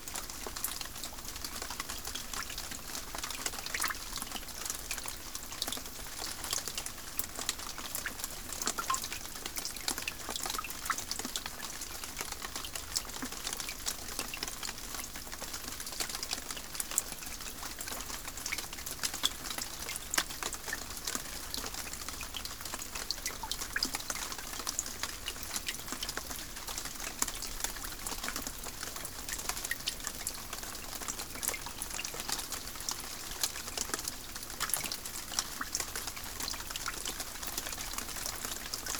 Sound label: Rain drips on leaves puddles; boom library; Thunder And Rain